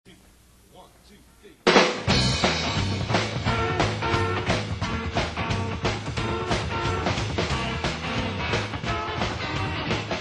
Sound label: RUMBLIN BASS ROCKABILLY